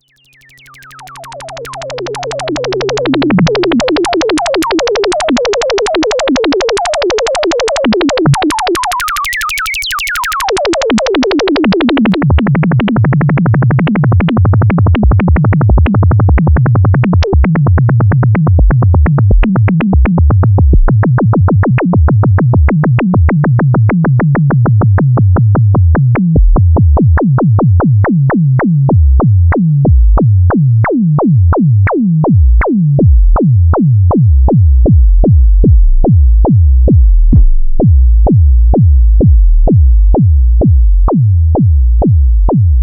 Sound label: Sample Hold; Axxe